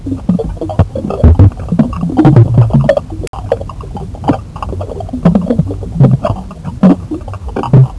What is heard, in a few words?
glub